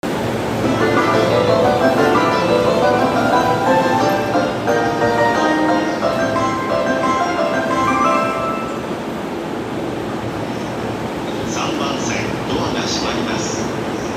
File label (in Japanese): JR 京浜東北線 東京 北